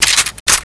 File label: p90 clip in, weapons